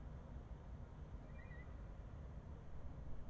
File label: little curlew, night flight calls; beijing